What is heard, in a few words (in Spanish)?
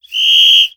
silbato